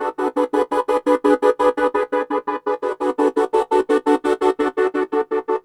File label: Gated Strings